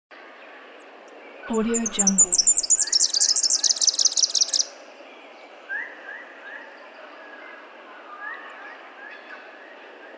early morning birds

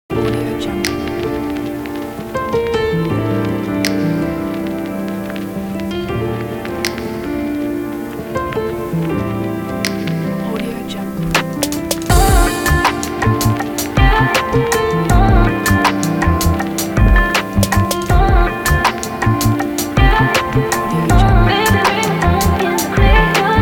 lofi hiphop

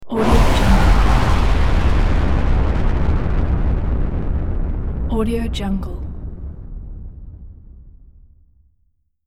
explosive fury

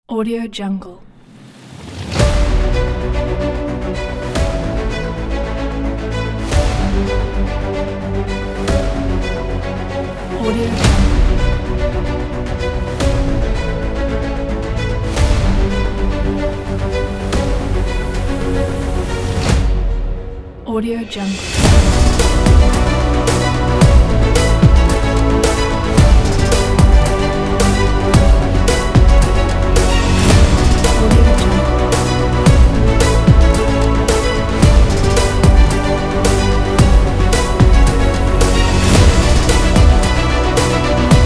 epic beat

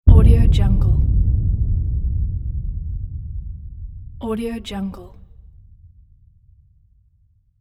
boom

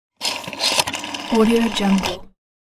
phone rotary dial zero

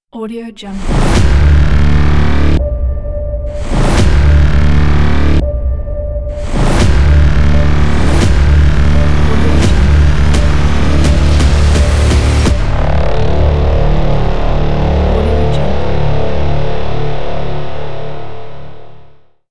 trailer opener logo

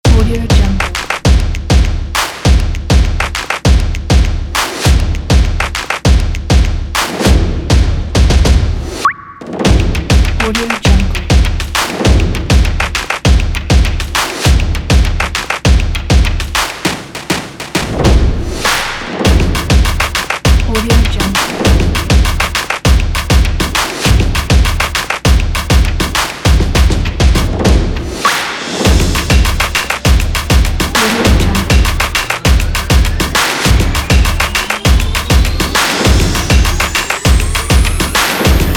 stomps

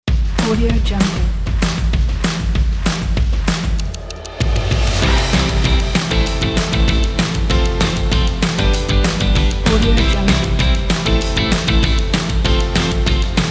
happy upbeat

